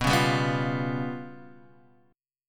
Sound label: G 7th Flat 5th